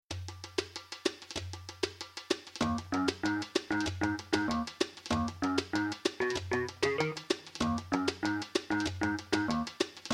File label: Inst